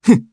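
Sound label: Vox Landing, Japanese; voices; heroes; Fluss; kingsraid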